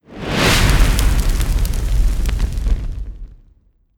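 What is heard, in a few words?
Fire Elemental Sparks swoosh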